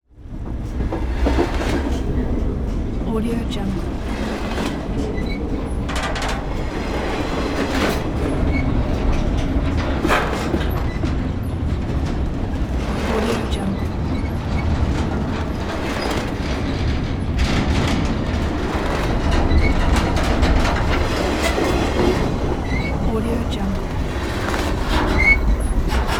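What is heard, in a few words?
freight train close up pass by clanks swishes; prosoundfx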